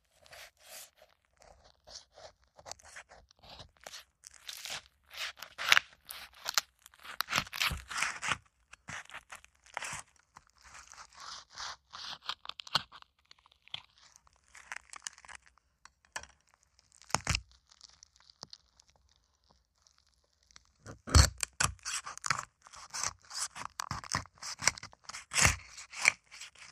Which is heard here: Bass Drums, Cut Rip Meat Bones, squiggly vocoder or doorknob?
Cut Rip Meat Bones